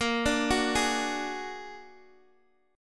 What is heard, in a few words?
A sharp Augmented 7th, Guitar Open D; x,x,8,8,9,6